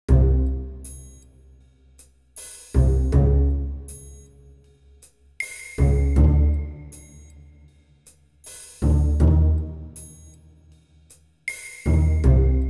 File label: Strange Pizzicato